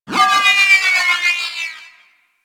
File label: Robot Metallic Textures, Ambience; Warframe; Venus Open World; Objective Rooms